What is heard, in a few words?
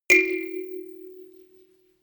notification kalimba rattle second